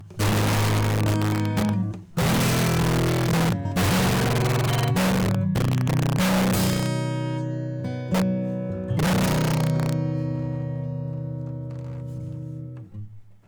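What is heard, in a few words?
Exciter Trash Scratch; Neutron 4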